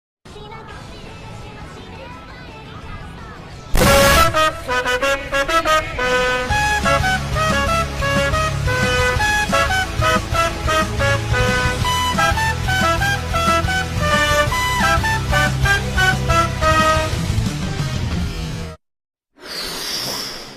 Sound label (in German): trompete attacke